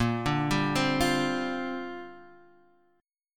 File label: C 7th Suspended 2nd Suspended 4th A sharp; arpeggiated